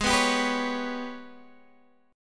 Ab Minor Major 7th Double Flat 5th, down, Guitar, Half Step Down; AbmM7bb5